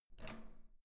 door handle up hard

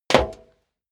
step leather shoes metal dry jump; schritte

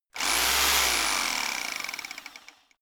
rock drill chisel burst short; schrauben drehen hydraulik

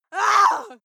female lunatic scream short

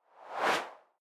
whoosh simple steady high; swooshes-swishes

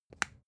spice jar smack close

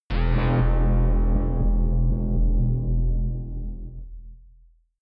blop bass; whooshes rises

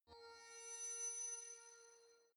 space mysterious chime ring; sweeps swells; comedy-cartoon; soundpool

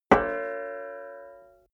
plate hit ring stopped soft

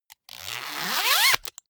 zipper pullover jacket close accelerate; objekte; reissverschluss

